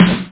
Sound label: snare dr; Android